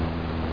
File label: moter; Shareware Games Galore!; arcade; water